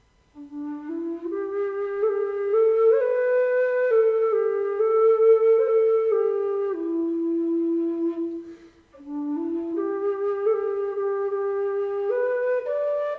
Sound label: low d whistle; twz sonora